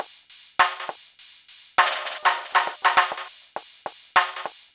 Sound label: PHATT BEA, Hip Hop